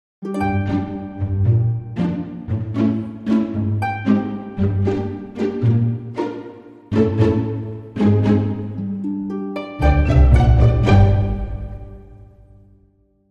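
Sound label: Dramedy Sting, No Percussion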